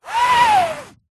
fingers rub scratch canvas art picture down

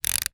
leisure toy plastic wind up; zapsplat

